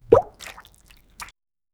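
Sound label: a hand splashing into water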